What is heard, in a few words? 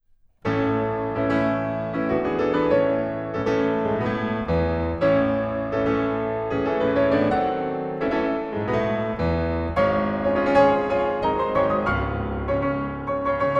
Polonaise in A major; chopin